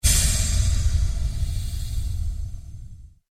Created Pulse Reverb, various sweeps, pulses and drones; Rise And Dive; Sound Design; Rises and Dives; SoundEffects Foundation2